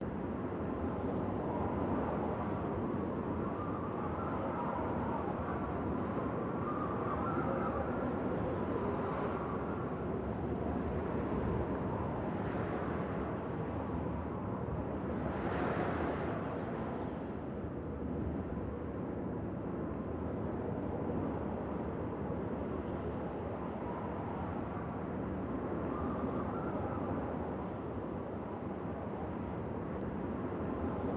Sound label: Normal Wind; God Eater 2 Rage Burst